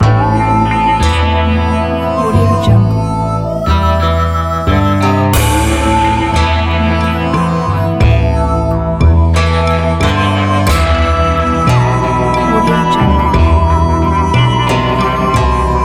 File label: western laid back cowboy